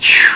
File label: swoosh